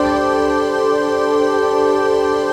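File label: chord pad, Pads; Best Service; XX Large - Pads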